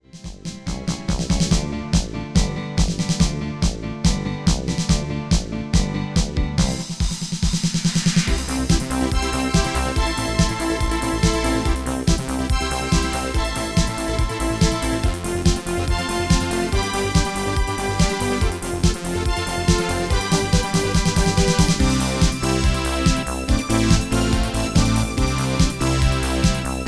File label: MIDI